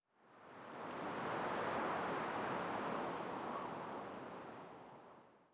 Dust Wind; blue archive

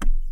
tock, ui

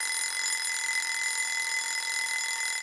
School Bell; blue archive

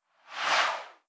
BG Scroll; blue archive

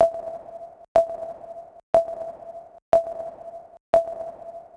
Drone Count; Decagram Ex; X5; blue archive

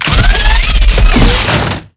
Drone Vulcan Death; blue archive

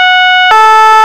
french gendarmerie, sirens

